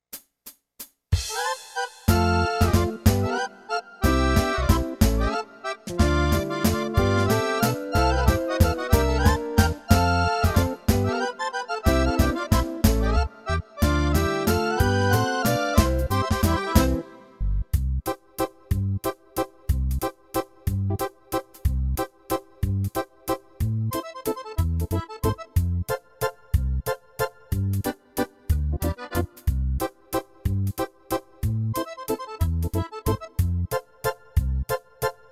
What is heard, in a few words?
Style Italian Waltz; Korg EK50